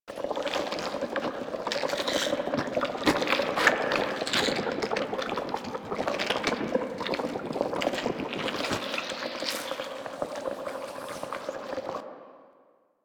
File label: destructable cyst loop; Ambience; Props; Warframe; Infested Eris